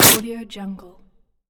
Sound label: torn sheet